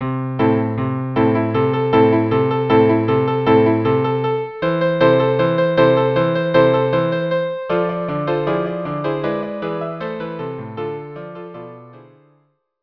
violin; Siman Tov

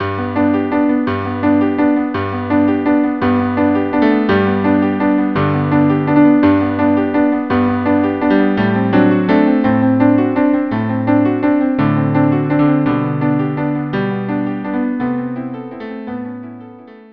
quartet

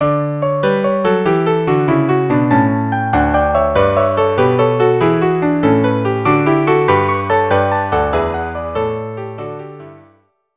Gigue, string quartet; Pachelbel; canon in d and gigue string quartet

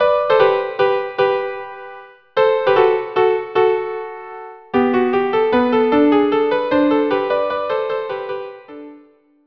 viola duo; Rossini; 5 duos

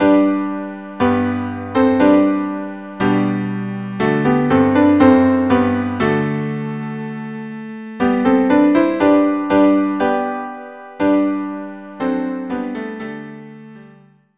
Chorus cello piano

